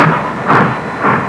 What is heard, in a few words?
train chug; Sound Library 2000 - Wayzata Technology; Transportation